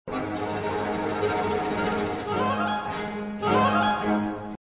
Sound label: D american baroque; MagnaLoops